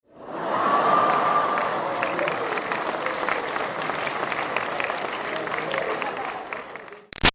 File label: Crowd Applause Audience Grad Laughs; Auditorium; Graduation; Human